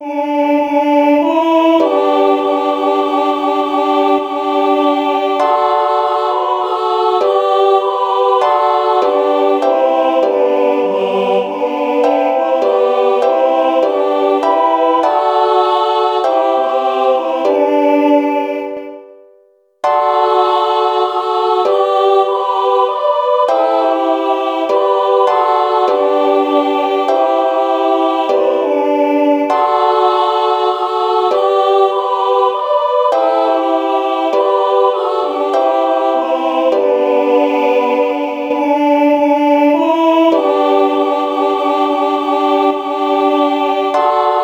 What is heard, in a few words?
Uke, ukulele